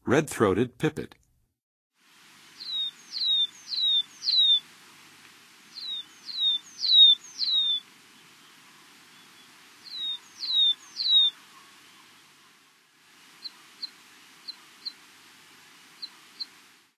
Red-throated Pipit; North America; Western USA; Western USA Songbirds

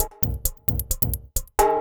HiHat Perc Loop, Dance Beats

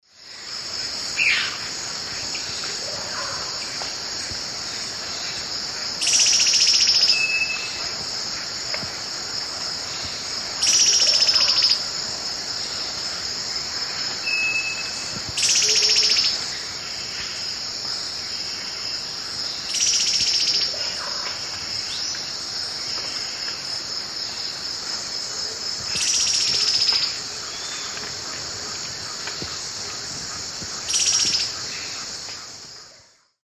Myrmoborus myotherinus, scold male, alarm female; S. America; Eastern Lowlands

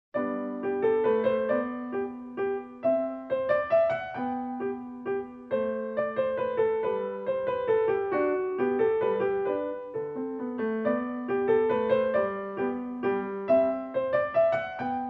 Menuet in G major, Piano Pieces; Traumerei

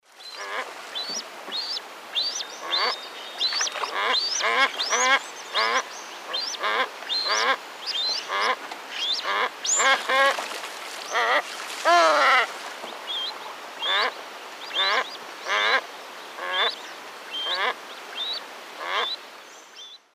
Neochen jubata, calls; S. America; Eastern Lowlands

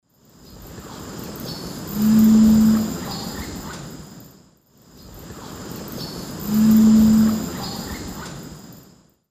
Cephalopterus ornatus, song; S. America; Eastern Lowlands

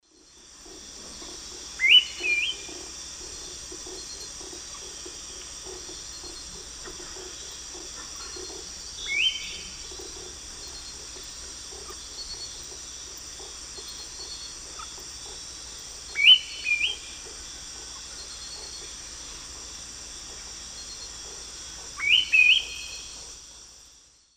Turdus ignobilis, calls; S. America; Eastern Lowlands

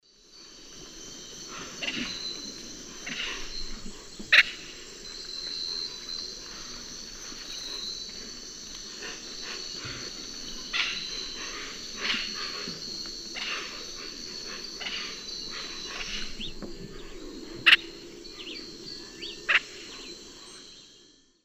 Megaceryle torquata, flight calls; S. America; Eastern Lowlands

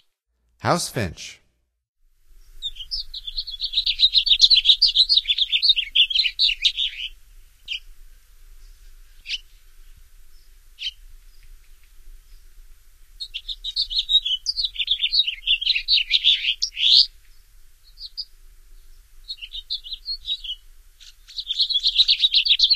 House Finch, Songs of Birds of North America; Stokes

